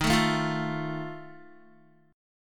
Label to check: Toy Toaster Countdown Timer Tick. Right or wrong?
Wrong